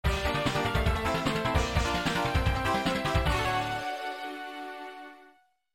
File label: stage clear